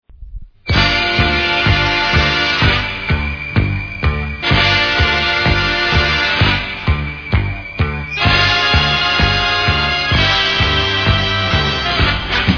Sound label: band sounds; disco